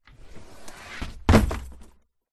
foley luggage suitcase fall from cupboard onto floor